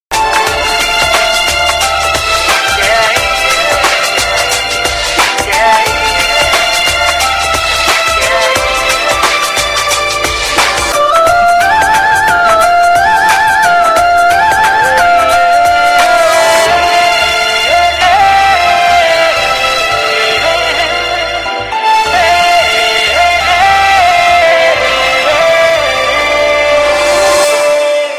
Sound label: Inst